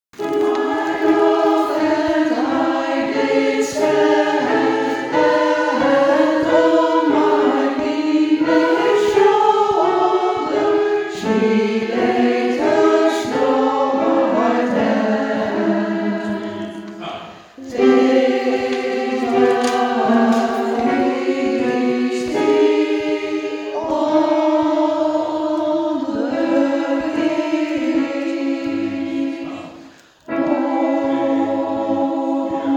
The Sally Gardens Sopraan Alt

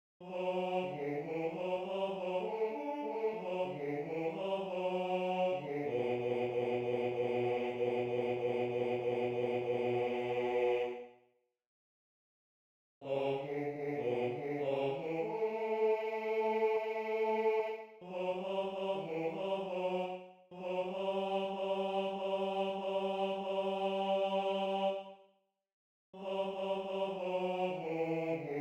Die Waldvogelein Bas; Mendelssohn

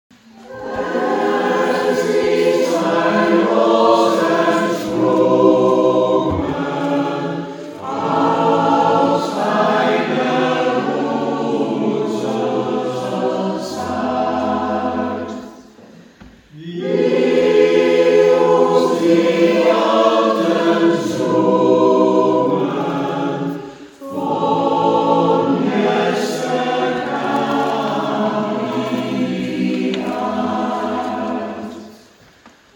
Tutti; Es Ist Ein Ros Entsprungen

